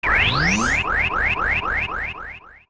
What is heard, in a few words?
Mirror Shield Absorb